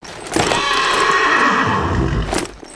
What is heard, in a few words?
horse whinny; creatures organic